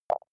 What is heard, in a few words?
click boxy; signale